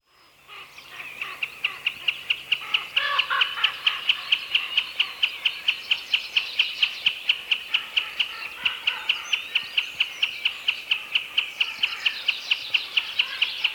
Watersnip Gallinago gallinago

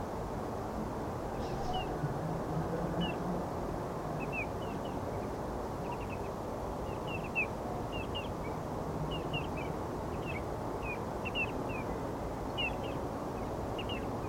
Steenloper Arenaria interpres

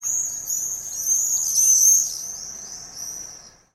Gierzwaluw Apus apus

